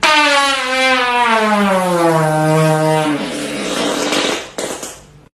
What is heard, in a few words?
machine gun fart